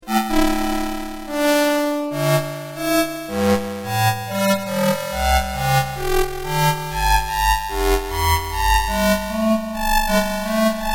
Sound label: Ring modulation piano